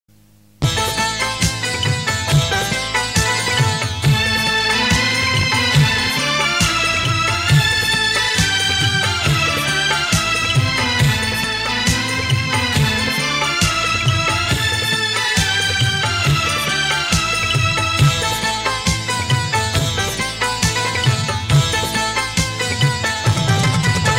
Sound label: Sindhi Tone